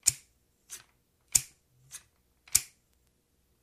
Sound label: Hedge Clipper Cutting, Slice Clicks